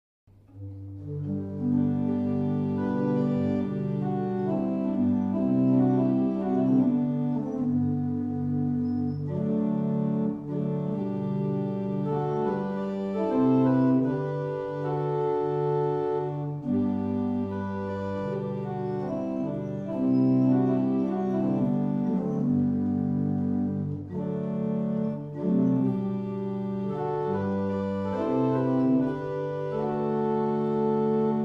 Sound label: barrel organs; Fobbing